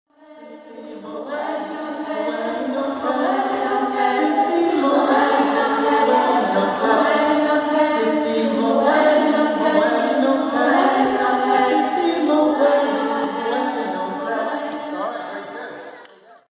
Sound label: Family Choir; Fishergate